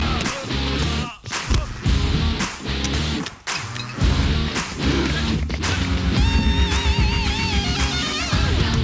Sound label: guitar beats; ringtones; hip-hop; aarya 2